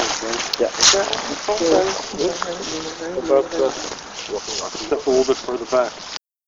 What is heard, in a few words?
cemetery